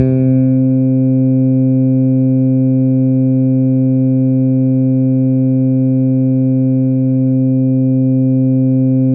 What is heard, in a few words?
C3, SynthLine